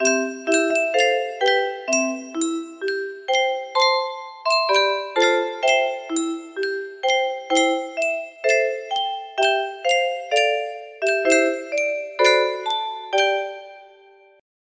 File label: Yunsheng music box